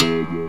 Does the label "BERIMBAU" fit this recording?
Yes